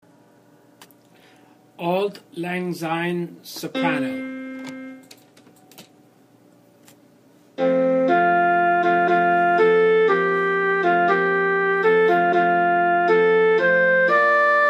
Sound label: Auld lang syne S; sop; beethoven; fourscottish